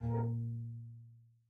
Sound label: lower oneshot hoover